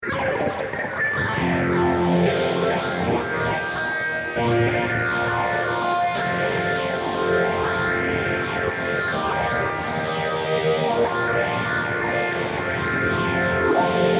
electric

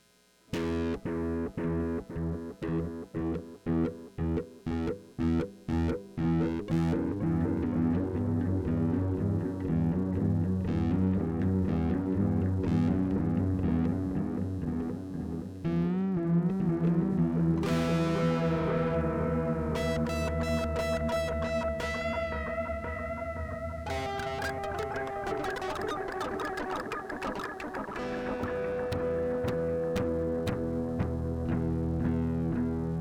electric space distortion

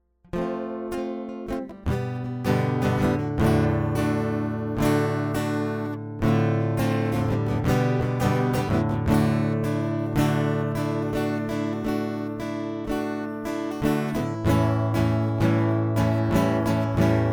acoustic; MegaEpix

